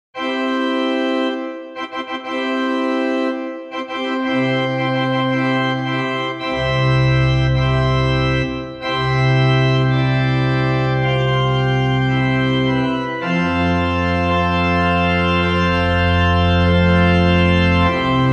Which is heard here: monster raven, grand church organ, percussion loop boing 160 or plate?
grand church organ